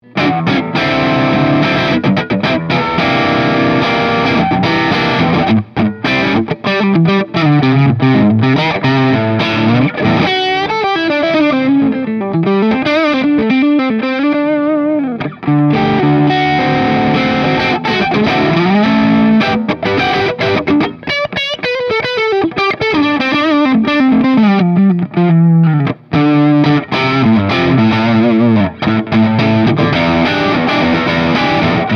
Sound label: lead crunch